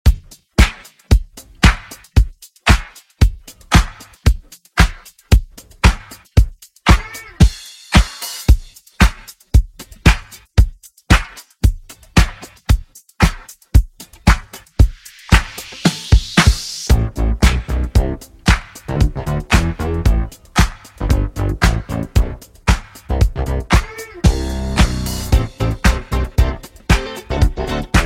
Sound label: Funk 114Bpm